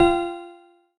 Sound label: notification; simulacra